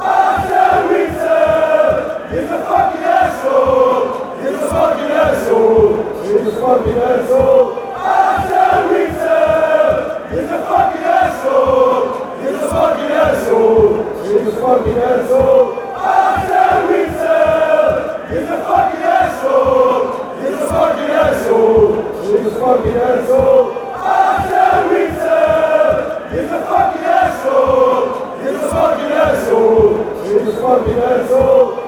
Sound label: anderlecht chant